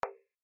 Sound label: hit; quatuor